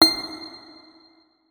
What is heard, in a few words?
notes, guitar; Hideout Furniture